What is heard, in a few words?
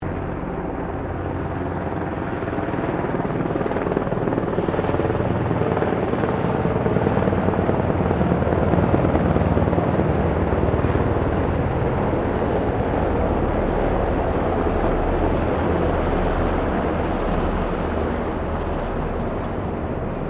HELICOPTER 1990 SIKORSKY UH60 BLACKHAWK PASS; Helicopters; Sound Effects:Vehicles